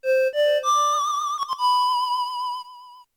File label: Whistle, FM Stereo; The Legend Of Zelda OST